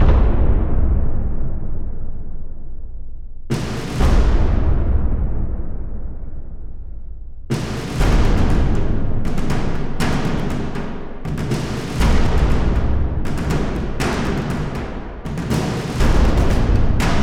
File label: Battlefield Epic Variation 120BPM, Cinematic Trailer Music; BlueFX